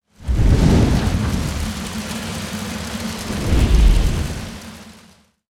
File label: Nursery Fire